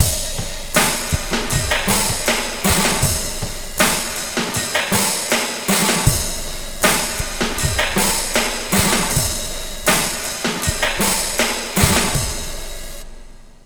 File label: Slo Mo Drumz Wet, Funk, Lounge; Zero-G Kocktail Kollection; Construction Kitz (Lounge)